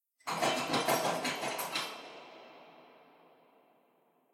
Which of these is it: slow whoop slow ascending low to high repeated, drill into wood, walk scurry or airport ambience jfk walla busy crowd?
walk scurry